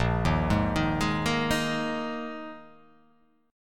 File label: A sharp 7th Suspended 4th A sharp